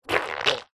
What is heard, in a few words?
cartoon squelch squish mouth saliva